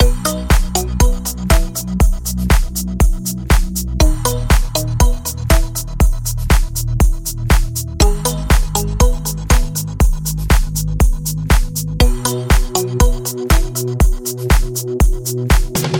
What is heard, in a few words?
Electronic Song